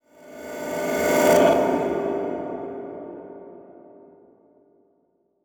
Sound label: Title Transition Swell; Production Element